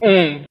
mmm male; ooh ahh

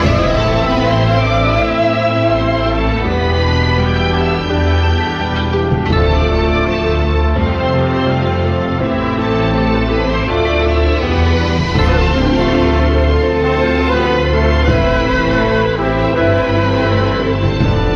strings uncertain, Sad Loops

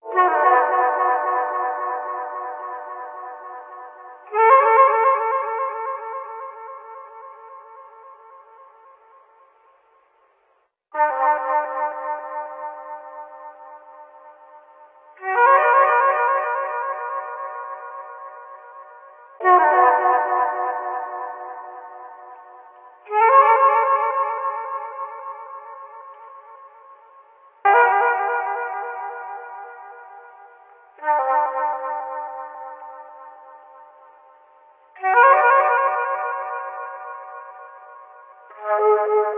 flute echoes with no beat, Loops

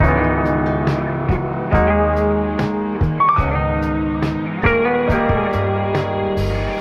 guitar piano, Loops, Phonky

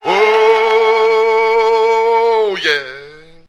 woah yeah, old blues man, SUNG; ooh ahh

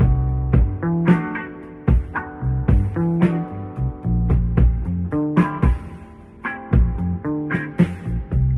bass; Phonky

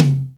Toms Real

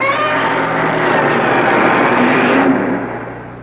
godzilla

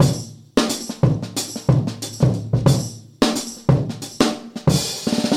airy room rolls, Drum loops

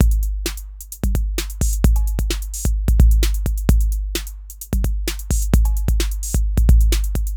Drum loops, Electro

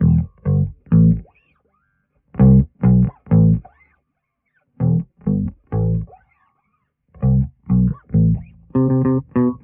bass, Loops Phonky

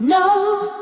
"no", SUNG; Voice; expletives

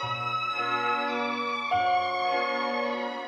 strings, Loops, Sad

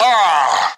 "ah", Voice

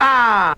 "ah", Voice; ooh ahh

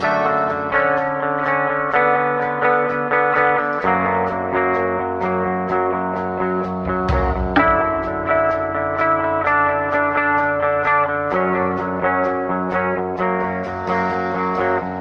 guitar, Loops

